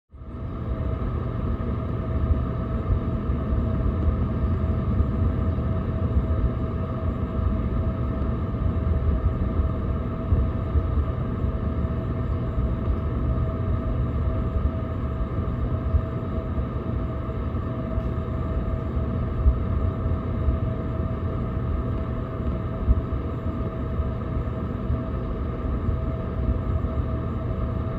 Heater

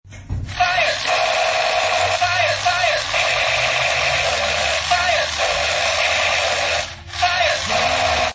Fire toy gun

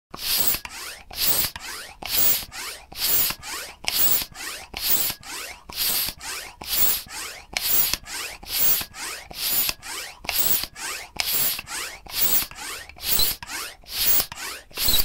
hand pump

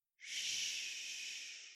shhhhh; Hush

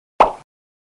Pop up

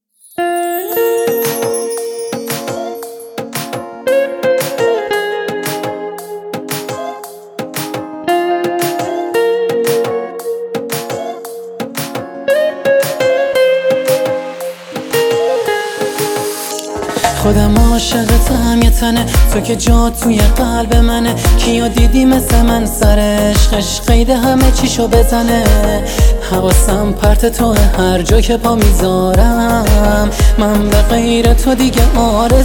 male

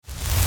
fire winds swoosh, campfire; designed

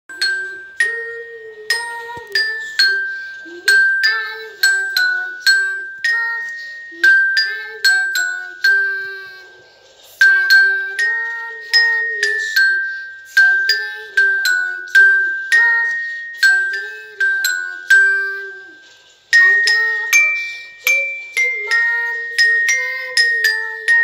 Bells, bells instrument

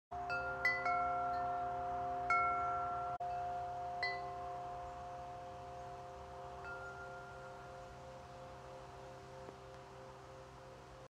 Wind Chimes

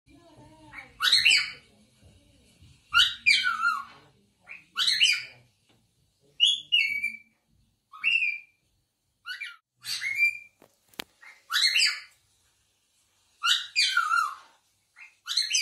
cockatiel Speaking; albino

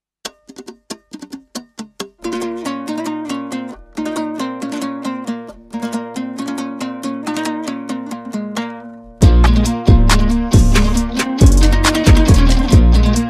dombra

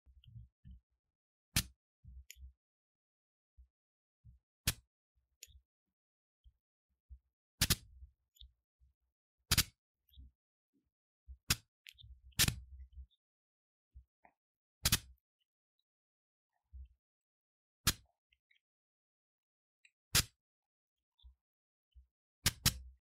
Perfume Spray; color heir

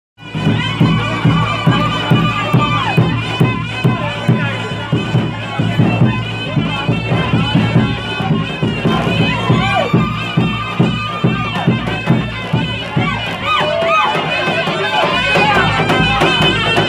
timpani

